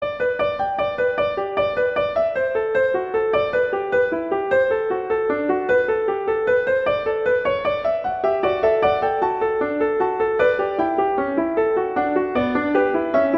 Fugue; Bach; Prelude Fugue XV

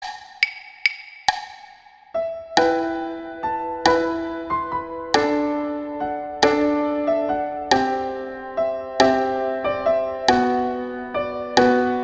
What Child Is This Greensleeves; Christmas

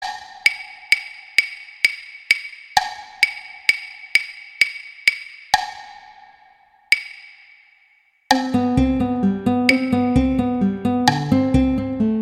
Larghetto Violin; Paganini; Sonatas Op1 Vl Gu; Sonata No 2

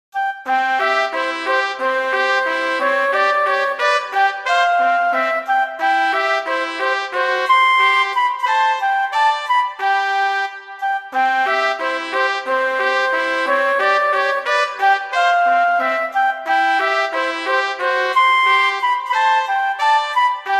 Duet; Mozart; Duets

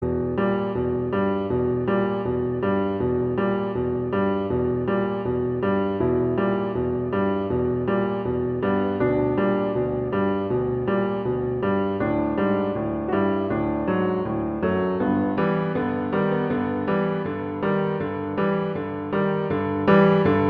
A minor, Lento; Chopin; Preludes

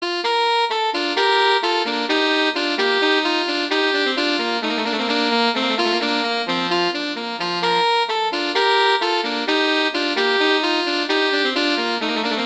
Allegro; Telemann; Canonic; Sonata 1

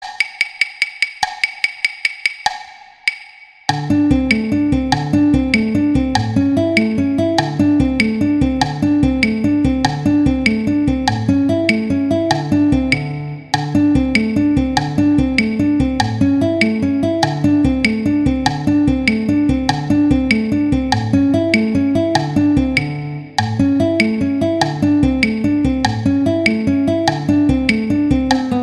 Allegro Violin; Paganini; Sonatas Op1 Vl Gu; Sonata No 3

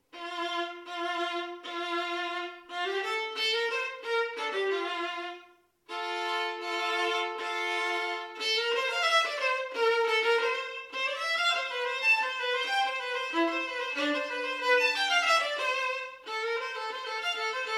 Melody, violin; Fitzpatrick; Violin Melodies

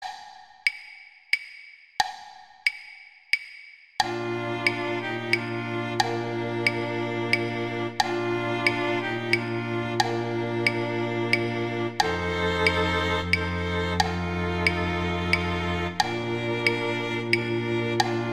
Viola; String; Gruber; Silent Night

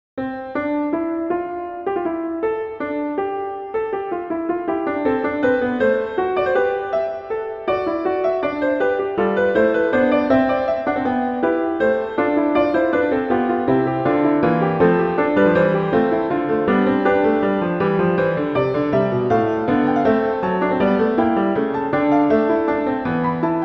Fugue; Bach; Prelude Fugue I

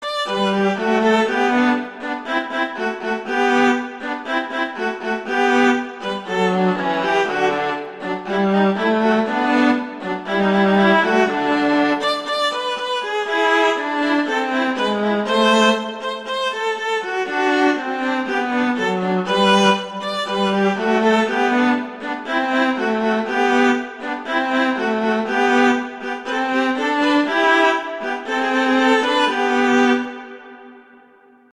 Duet; Mozart; Duets; Vla

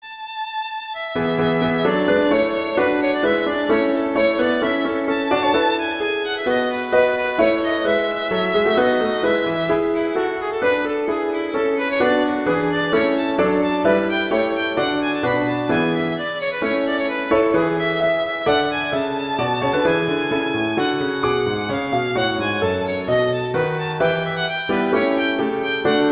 Allegro; Sonata; Handel

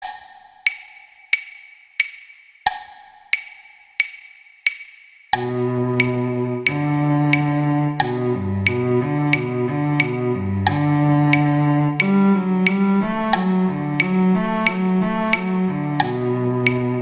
Cello I; Duets; Mozart

